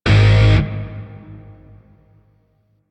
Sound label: Shawzin Min Chord H; Grineer; DE; Warframe